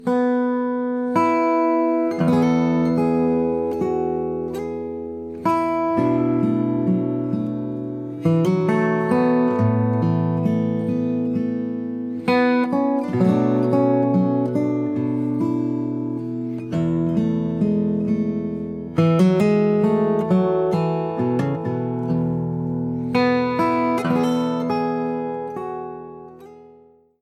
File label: acoustic instrumental